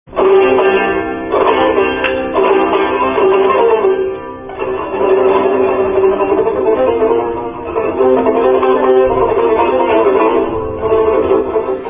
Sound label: saghar